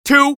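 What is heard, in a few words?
scout taunt rps exert, male voice; taunts; tf2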